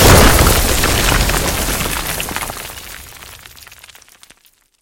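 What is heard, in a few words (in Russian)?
обрушение кирпичной стены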